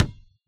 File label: Basketball Hit; hello neighbor pre-alpha alpha 1